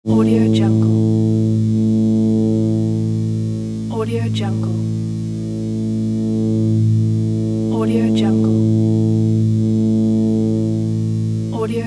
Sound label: electricity generator